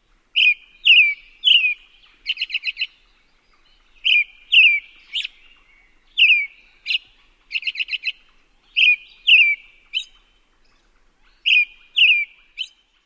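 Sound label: song and whinny call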